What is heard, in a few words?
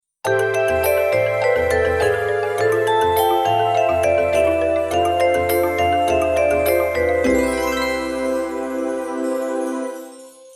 Spring Box